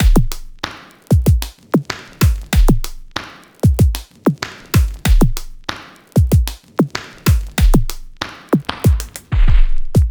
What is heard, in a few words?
BEAT 95